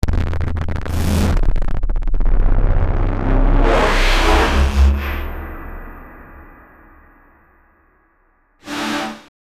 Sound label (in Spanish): efecto distorcion de sist, portal